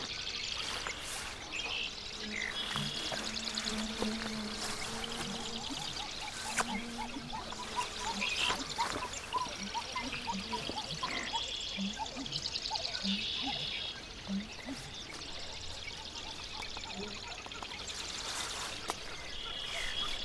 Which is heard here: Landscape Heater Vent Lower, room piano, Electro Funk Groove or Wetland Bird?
Wetland Bird